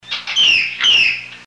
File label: parrot